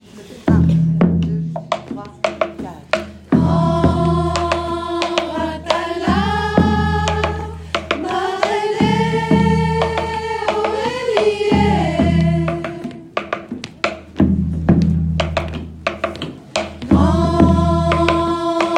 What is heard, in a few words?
sop, singers; Outdoor-Events; Deblozay